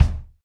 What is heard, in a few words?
Rock Kick; Roland BK-5